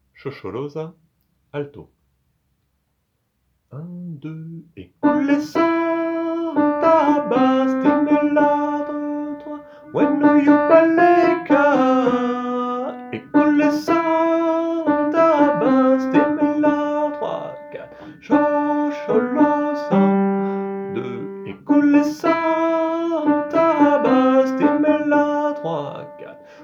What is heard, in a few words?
shosholoza alto; Traditionnel Sud Africain